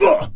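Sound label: DAMAGE, Monster; Champion; 금전방; 무적폐랑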